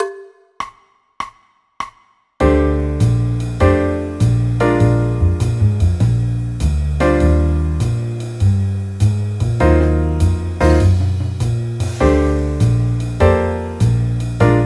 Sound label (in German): bb dur akkordverbindungen